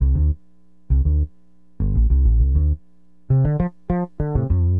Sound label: BASS, Acid Jazz Funk